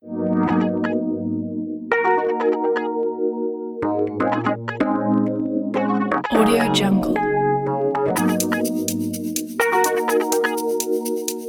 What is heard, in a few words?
fashion disco lounge